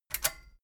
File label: metal button stereo click disable fast